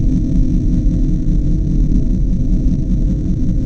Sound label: forcefield; The Elder Scrolls III Morrowind GOTY; XBOX